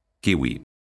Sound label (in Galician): kiwi son